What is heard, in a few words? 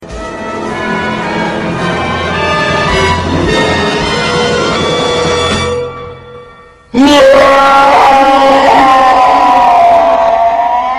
Roar; Hulk